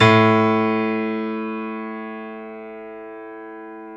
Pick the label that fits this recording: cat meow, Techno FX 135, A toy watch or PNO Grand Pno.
PNO Grand Pno